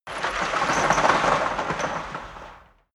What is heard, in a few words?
debris slide metal big; soundpool